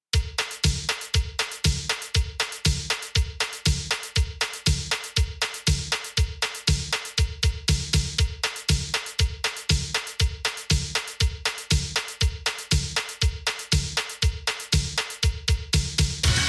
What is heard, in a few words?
dark wave synth pop dance mix; the original 80s 90s